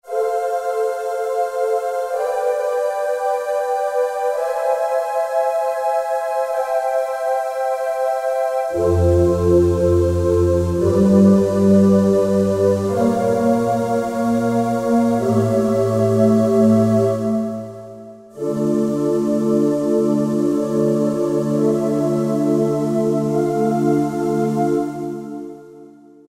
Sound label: Oo Choir; Yamaha EX5R; Factory presets